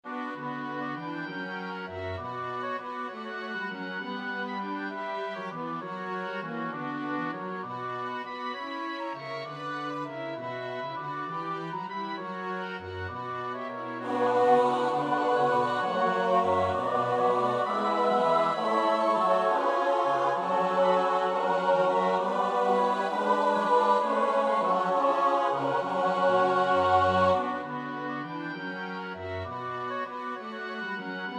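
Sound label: soprano alto tenor bass; Bach (Johann Sebastiaan); BWV 107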